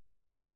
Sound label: piano